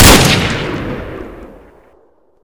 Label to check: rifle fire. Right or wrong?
Right